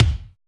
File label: PUNCHME K; POP-DNC 2